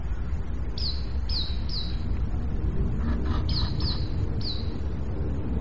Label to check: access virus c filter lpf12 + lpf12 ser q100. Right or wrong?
Wrong